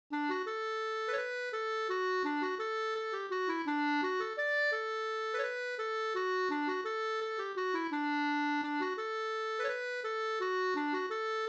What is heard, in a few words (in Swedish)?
vals, D dur; Sorunda